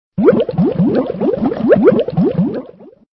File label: Bubbles